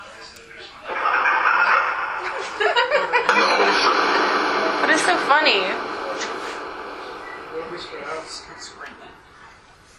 Room 409 Downstairs Phasma Box

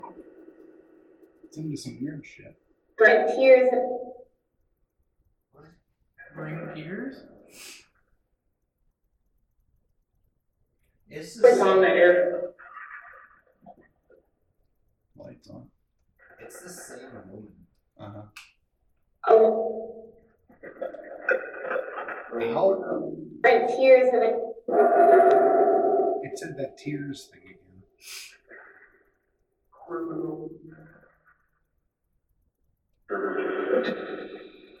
Hallway Phasma Box

